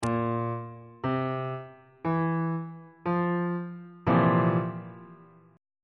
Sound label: e sharp major 7th suspended 4th 1st inversion on bass clef